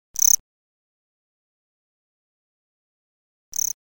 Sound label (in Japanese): kumakoorogi